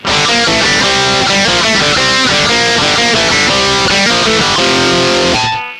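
Les Paul Marshall bridge, Bridge pickup, Sansamp в режиме Marshall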